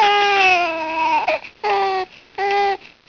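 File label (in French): Pleurs Bébé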